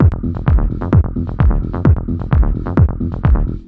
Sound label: 130 evil bassline; melodic loops; synth and electronic loops; elab